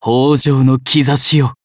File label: attack; Kirsch